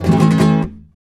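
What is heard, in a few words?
rasgueado A# major, guitars, flamenco; Spectrasonics; Hans Zimmer Guitars VOL-2; FLAMENCO FX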